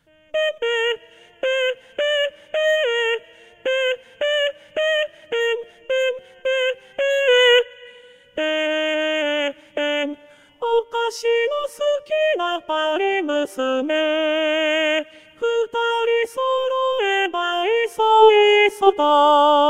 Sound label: Tenor Ⅱ